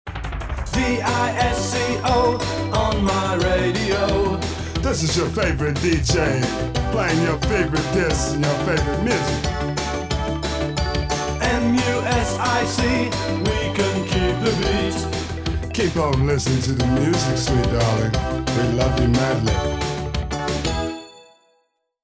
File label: Jingles